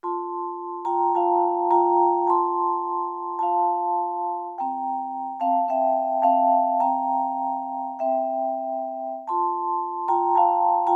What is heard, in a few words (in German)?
Kyrie F-Dur